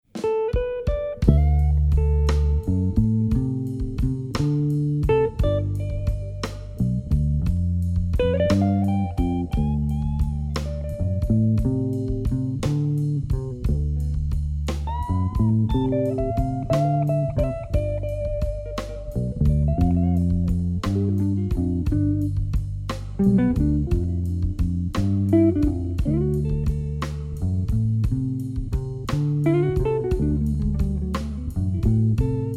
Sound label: Slow Blues Sidestick Drumming